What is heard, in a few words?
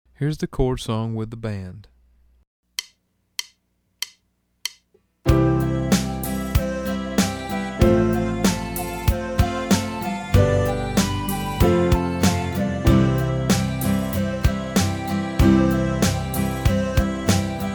Chord Song Fast